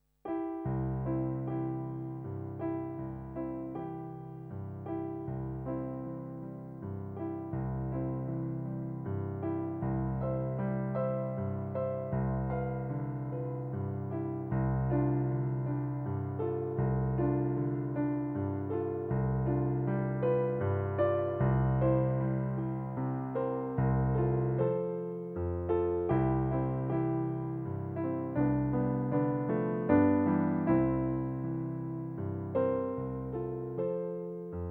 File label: Cradle song Wiegenlied Piano only